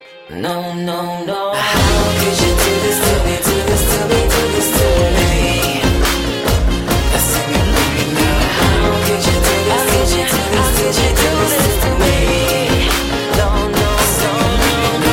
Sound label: In English